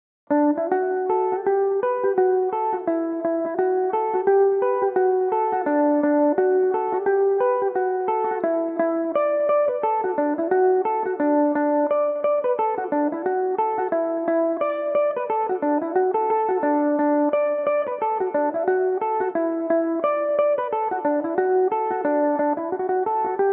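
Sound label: reel, D major, mandolin; lisheen reel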